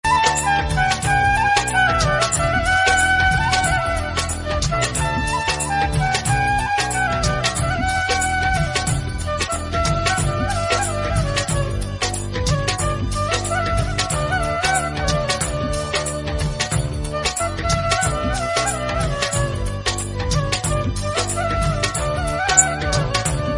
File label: Special Bansuri; ringtones